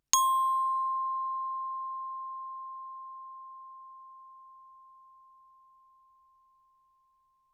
bells plastic ff C6; Percussion

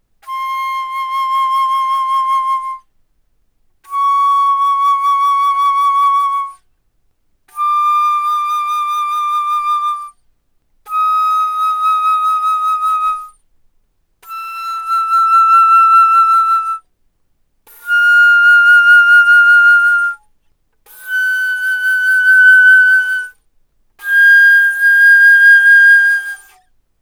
Alto Flute mf C6 G6, woodwinds

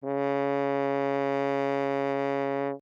Horn ff Db3 stereo; Brass; MIS Pitches - 2014

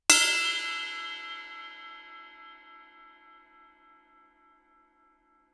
crash stick bell ff stereo, Suspended Cymbals; Percussion

